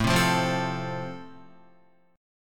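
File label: A Minor Sharp 5th, Guitar, chord strum; Am#5 strummed; JGuitar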